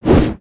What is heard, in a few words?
Mole Whacker Swing